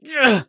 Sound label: hit; Escape from Tarkov